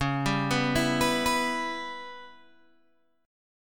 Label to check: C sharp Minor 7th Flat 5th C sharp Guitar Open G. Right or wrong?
Right